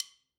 stick click; apulSoft apTrigga2; Multi-Velocity Cymbal Samples

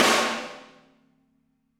Snare Crack

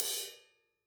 Crash Choke, cymbal

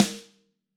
Snare Hard

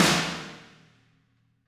Snare Hard

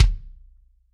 Kick Hard, Drums; apulSoft apTrigga2; Steven Slate Drums

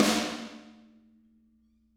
Snare Crack; apulSoft apTrigga2; Steven Slate Drums

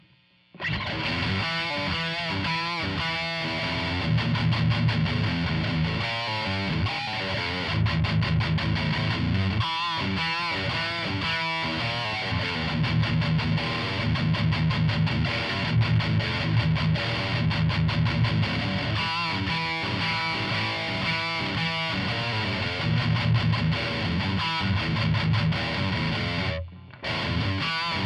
riff

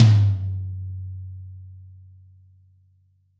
Birch Tom Hard; Drums; apulSoft apTrigga2; Steven Slate Drums

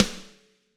Snare Crack; apulSoft apTrigga2; Steven Slate Drums

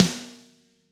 Snare Crack

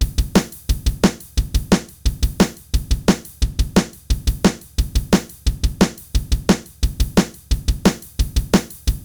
Drums; deadfoot drum training

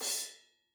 Crash Choke, cymbal

